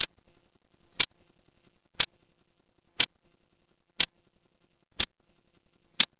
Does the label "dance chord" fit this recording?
No